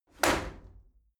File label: shower slide door open hit heavy